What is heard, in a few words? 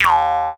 Jaw Harp; musicradar; cartoon caper